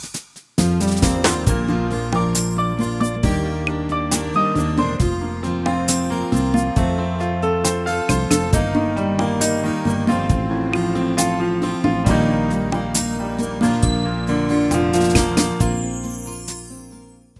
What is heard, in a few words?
pure love, ringtones